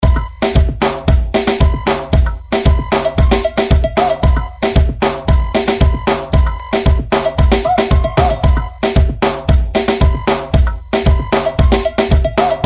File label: REMIX AMBIANCE